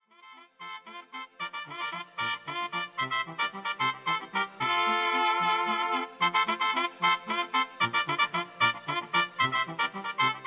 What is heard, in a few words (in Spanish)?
Chilena, ritmos, mariachi